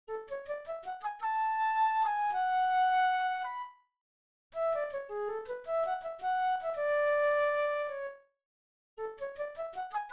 Flute Oboe Mallets

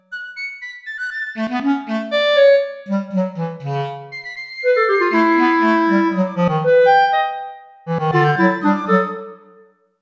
Chorale and Toccata solo voices; Piccolo, / Clarinet, Bass Clarinet, mm. / 63-69

